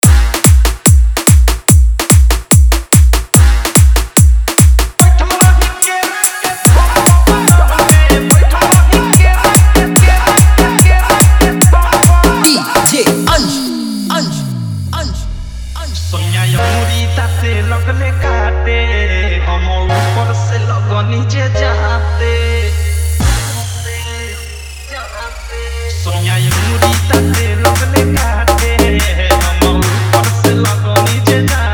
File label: EDM Mix